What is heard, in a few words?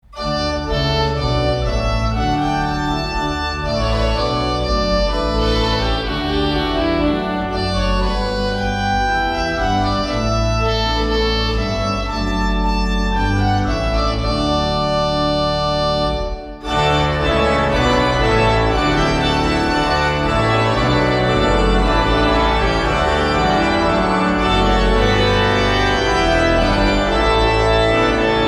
Trumpet